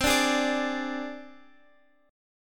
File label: C Suspended 2nd Sharp 5th C Guitar Drop D; down; chord shape